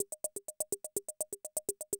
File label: CR78 125, drum loops